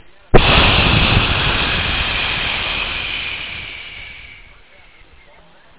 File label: Whoosh